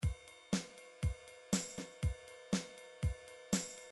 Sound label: midi drum patterns drums